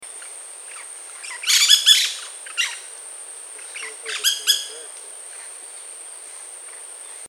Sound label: painted parakeet